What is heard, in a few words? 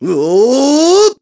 Run Attack Run; Ghirahim